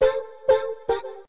Girl, notifications

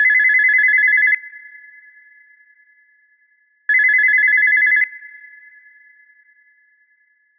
Phone Ring; MyPhone A919 Duo; ringtones

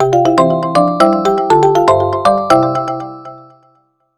Elastic Ball; LeEco; Le-2

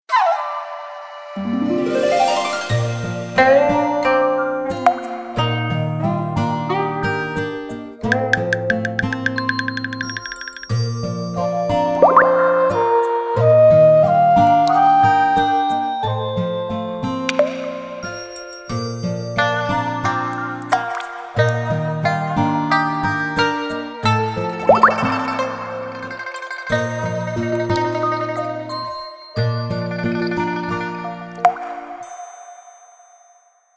Ethno, ringtones; Ethno Coolpad

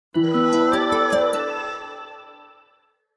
Morning breeze; Samsung Galaxy S CDMA SCH-i909; notifications

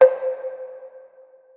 Notification

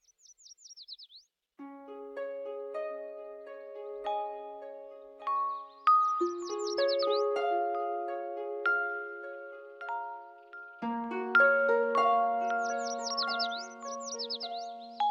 Morning Light, alarms; Honor; Magic-7-RSR-Porsche-Design

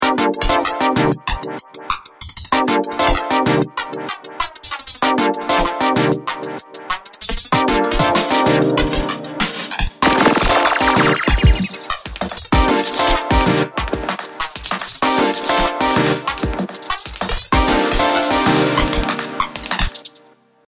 Club

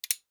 lock unlock; Smartisan OS 6.0.0

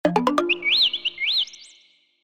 Bird Out; Vivo V5; notifications

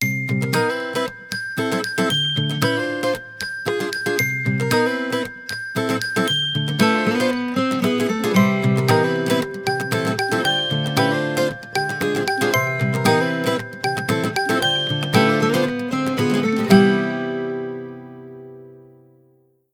Afternoon Walk, alarms; LG; G3-D855

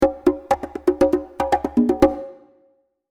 Conga, notifications; LeEco Le 2

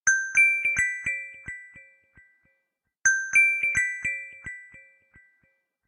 Dream, ringtones; Honor Pad X7

